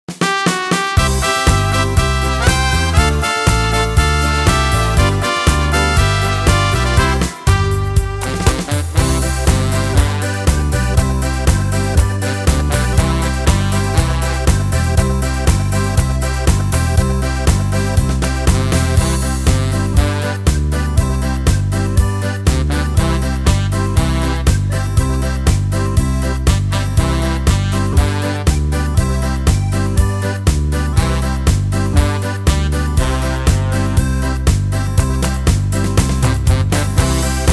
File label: karaoke